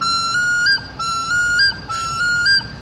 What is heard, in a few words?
ringtone